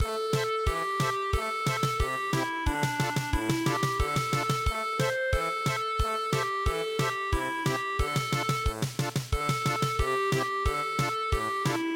German Polka

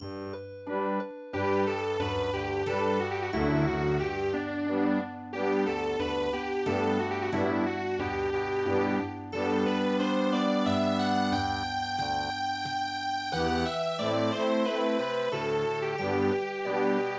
vienna waltz; Carmela

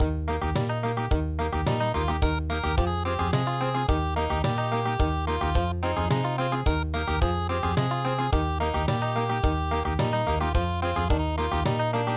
Country

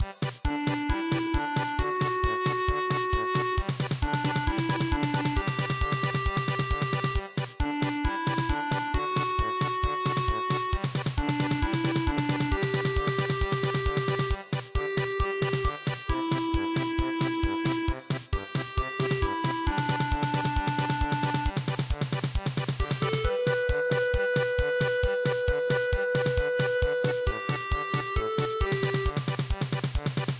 German Polka; midi; traditional

